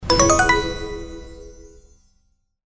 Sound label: reveal; Match For Cash; Outdoor America